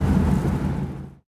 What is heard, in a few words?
engines; World of Tanks